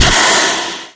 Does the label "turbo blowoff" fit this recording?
Yes